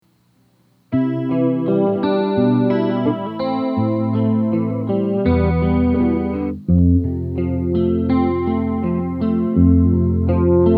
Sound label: Liquid, but not over the top (Rate @ Noon, Depth @ 2pm), chorus, guitar; CE-2; No EQ was used on the recording